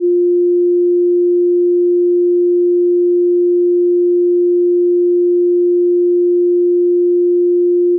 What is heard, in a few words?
shatter; black mirror